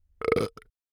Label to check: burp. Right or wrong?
Right